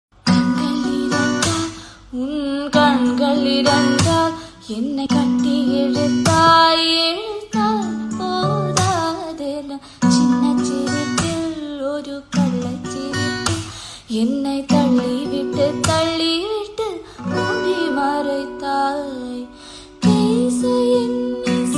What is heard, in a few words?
Female Version Tamil; ringtone